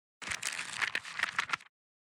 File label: thumb through the pages